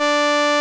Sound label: SOLINA, String Machines